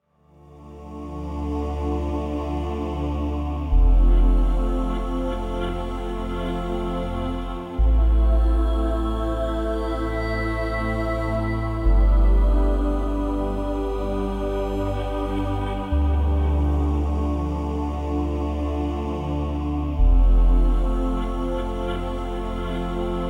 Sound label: Pause Menu Loop; Volume macos ps vita ps4 windows gamerip 2015